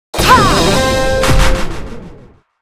wave; astro Legends Lyra and Erion Desktop; Foxium

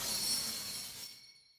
Line Win; Wu Xing; genesis

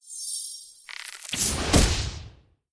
attack; Skillzgaming; battle Mania Desktop

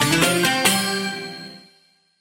win big; medallion Desktop; Fantasma

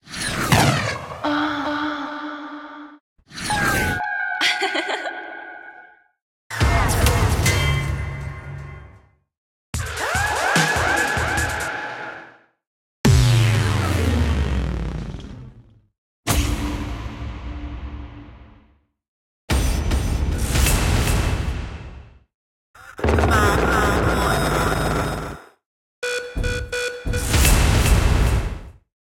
Game Sounds Sprite Symbols; Mahi Gaming

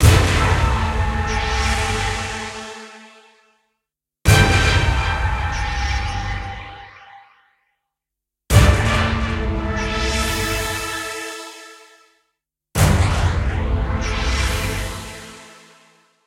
Win Sounds Big Win; romanov Riches

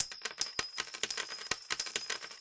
Coins Loop; Long Mu Fortunes

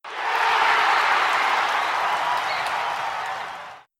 Bonus Positive; genesis; euro Golden Cup

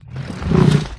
bonus idle; Recode; rampage riches Desktop

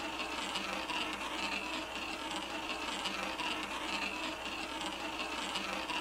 ball roll, roulette wheel; European Roulette; Real Dealer

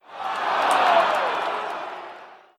Bonus Negative; genesis; euro Golden Cup

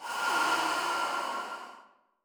Fight Sequence Loss; Wu Xing; genesis

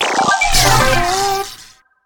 morph; astro Legends Lyra and Erion Desktop; Foxium

